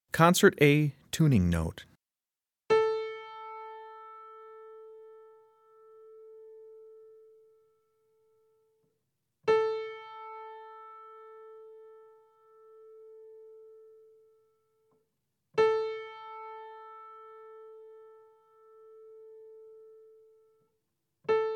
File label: Tuning Note